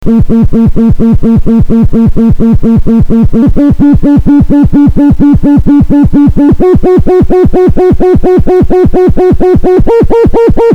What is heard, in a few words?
rec tone generator